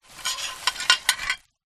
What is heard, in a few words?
household plastic bag containing broken plate pieces movement